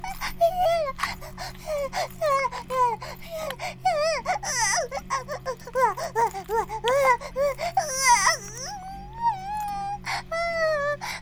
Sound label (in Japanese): バイブ放置, ASMR